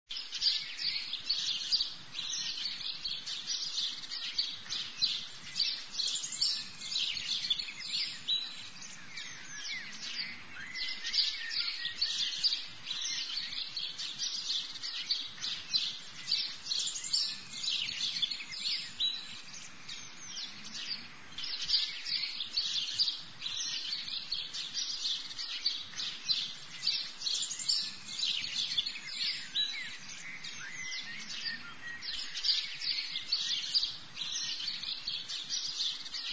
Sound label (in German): waldgeraeusche; Stimmen